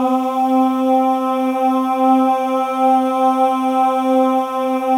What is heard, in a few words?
MALE AHS, Voices; Spectrasonics